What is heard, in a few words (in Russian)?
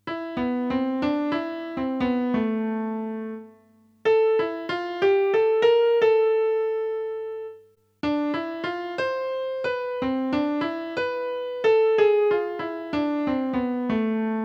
minus na pianino v obichnom tempe